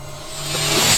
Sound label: FX; AMG Megabass REMIX! VOL-2